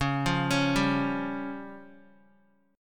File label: C sharp Major Flat 5th, Guitar; arpeggiated; chord; JGuitar